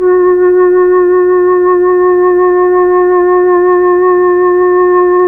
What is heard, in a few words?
FLT, Melotron Flt, Slow Flutes; Roland LCDP09 Keys of the 60s and 70s 1